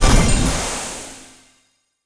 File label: Level Up